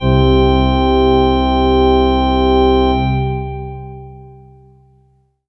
Yamaha V50 Large Pipes C2

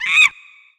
Monkey Screech

